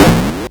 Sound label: Arcade Riffs